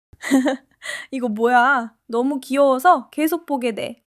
laughter solo laughter

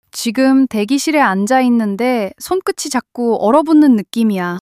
fluster long fluster

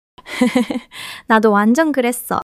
laughter

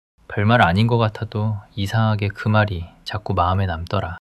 sulk long sulk

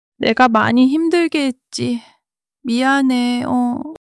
crying; monologue

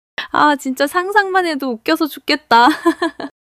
laughter; monologue

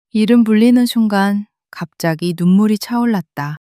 crying long crying

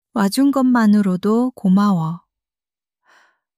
crying; monologue

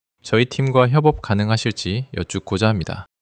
neutral long neutral

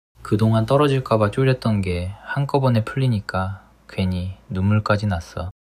crying long crying

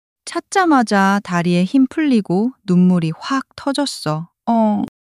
crying long crying

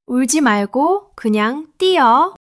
laughter; monologue

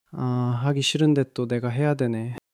sulk solo sulk

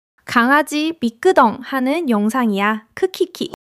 laughter

